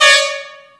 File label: start; UI Sounds